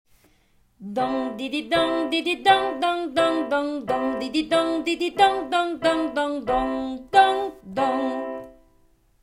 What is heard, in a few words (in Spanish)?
Twang oral "Dong didi dong" largo